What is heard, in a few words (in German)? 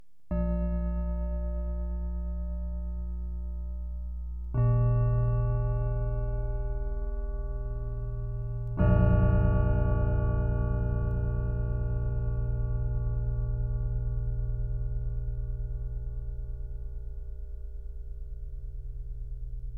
Gong Beispiel